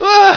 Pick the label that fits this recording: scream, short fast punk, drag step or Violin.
scream